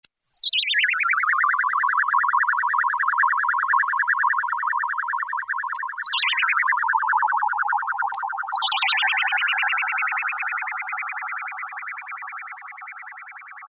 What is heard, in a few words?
SPACHIRP, Kawai SX-240